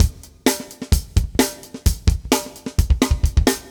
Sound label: metal